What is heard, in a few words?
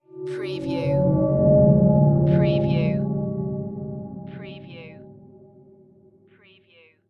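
Production Element Swell Eerie; Sound Effects Bible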